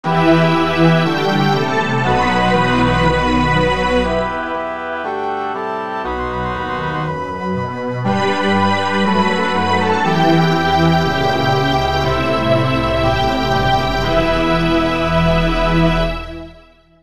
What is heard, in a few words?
Orchestra